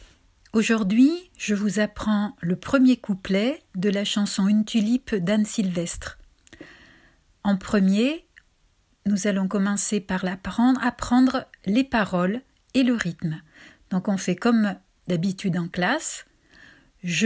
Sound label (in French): une tulipe RYTHME